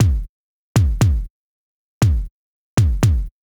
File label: Kick loop; Vostok Inc.